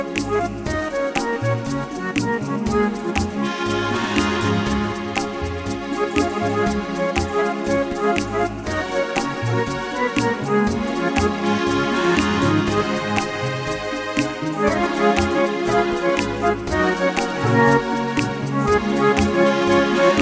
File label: Super Tango